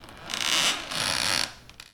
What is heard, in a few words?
Creaking Wood